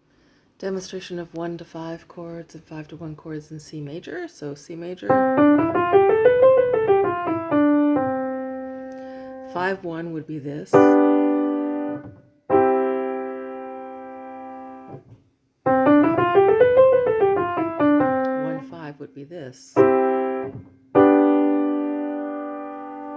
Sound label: Tonic-Dominant (I-V) and Dominant-Tonic (V-I) in C major with demonstration; Interval Identification